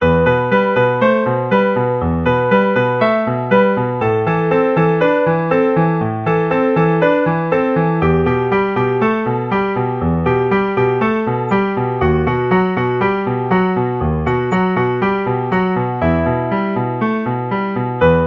Tango

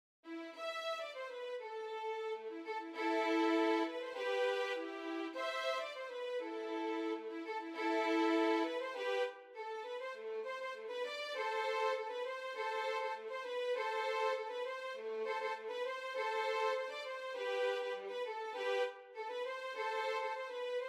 Violin

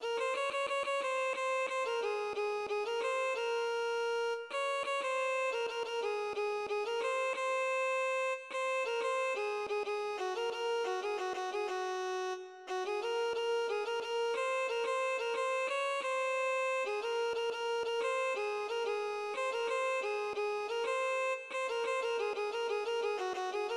Violin

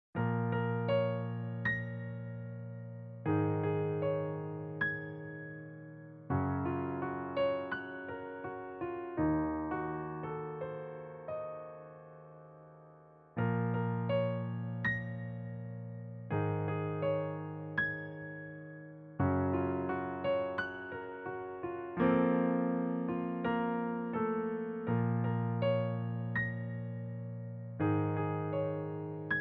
piano cover

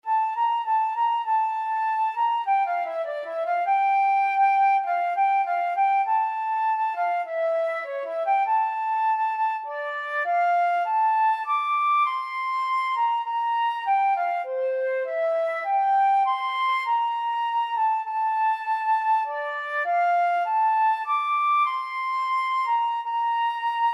Lonely Bar Piano

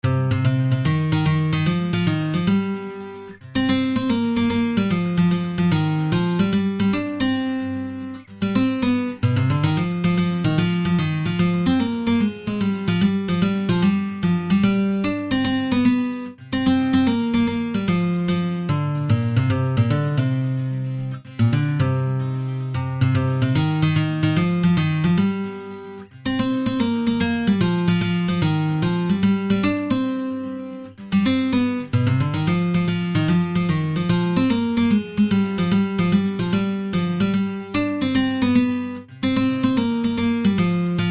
guitar; Don Chuck Monogatari

